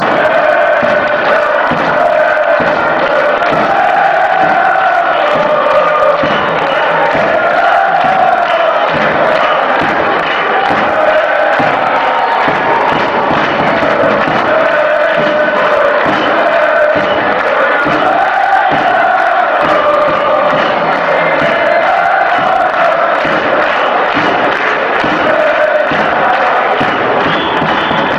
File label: well be coming, fan chants